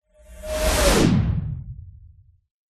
magic spell whoosh powerful energy pass electric; fantasy

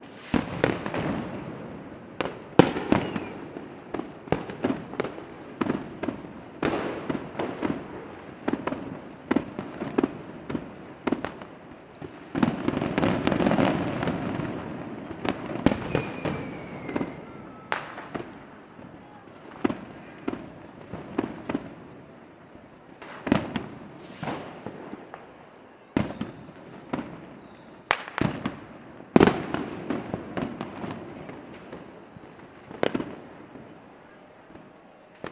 fireworks mixture urban; sound effects one

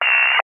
cb radio static interference burst

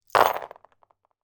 game metal playing pieces drop on playing board; monopoly

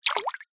nature water drip close up

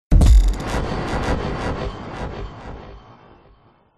sound design cinematic impact futuristic sci fi; zapsplat

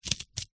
office scissors cutting cardboard single

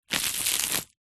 food toast squash crunch

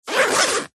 foley luggage rucksack backpack pocket zipper; zapsplat

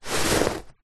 hand movement scrape carpet; zapsplat; foley

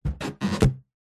foley balloon inflated short handling squeak; zapsplat

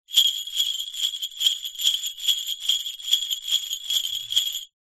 musical large sleigh bells med shake

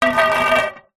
tin small empty no lid down on hard ground; zapsplat

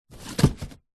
foley cardboard box small set down hard; zapsplat

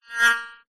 cartoon fly pass by; zapsplat

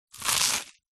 human eat bite toast single

